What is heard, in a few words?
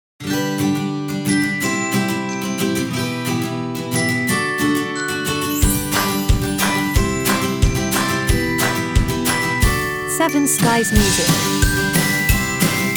Cheerful Promo